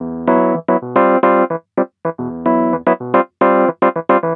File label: 110 NuJazz _Emaj, E-Piano; Pro Tools Essential Loops; Digidesign